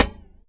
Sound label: impact Plate light